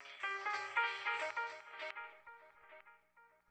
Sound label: Stab; Thirty-One Flavors